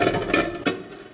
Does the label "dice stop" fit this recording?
No